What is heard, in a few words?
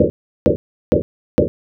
fs carpet